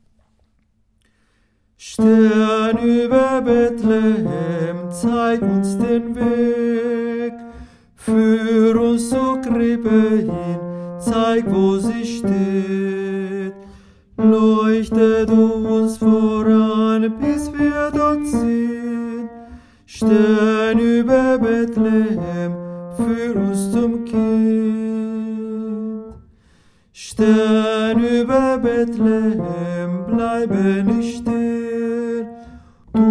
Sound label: Tenor